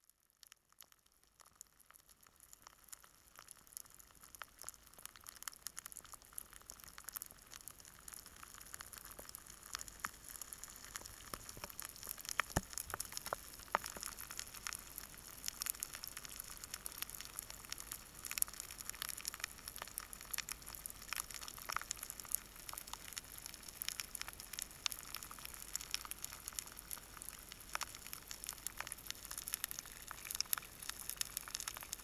melting ice, is a recording of piezo microphones frozen into a piece of ice